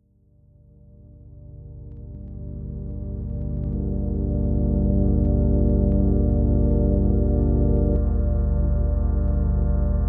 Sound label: realtime morph